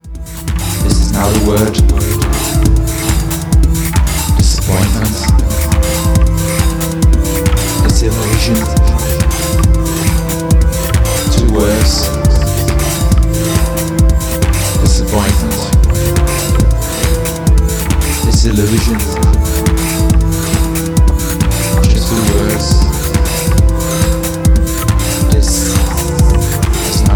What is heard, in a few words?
Dissapointment Vocal